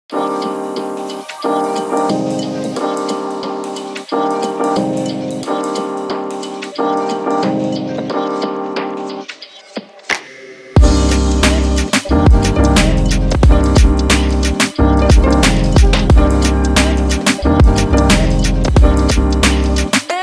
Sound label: groovy hip hop